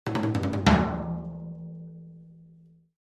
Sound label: Drums Toms A Accent